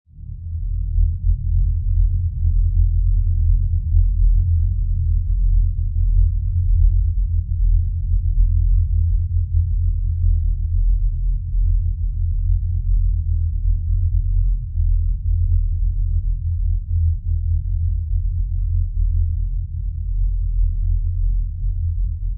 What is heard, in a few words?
Sub Sonic Space Rumble, Very low frequency, subsonic drone; Sub Sonic Space Rumble: Very low frequency, subsonic drone or rumble. Outer space ambience or onboard space ship ambience